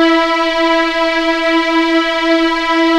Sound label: VIOLINS, E4 LEG; East West; The Ultimate String Collection